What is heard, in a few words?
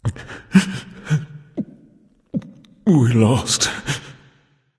boohoo; chaac